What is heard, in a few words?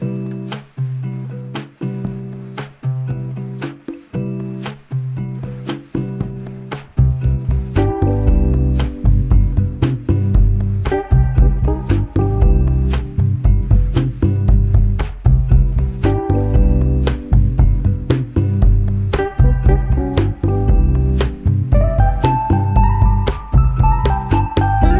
Smoothjazz